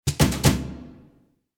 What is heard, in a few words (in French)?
alerte SMS; BIOPARC; identite sonore